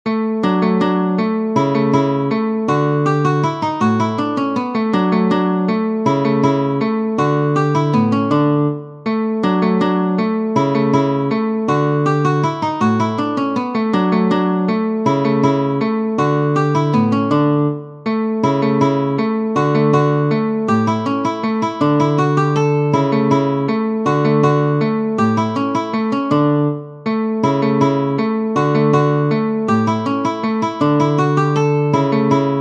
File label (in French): Walze, guitare; Ferdinando Carulli; Op. 241 Méthode pour la guitare